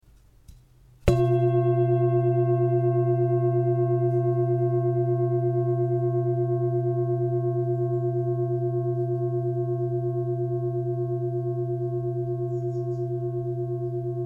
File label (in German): Klangschale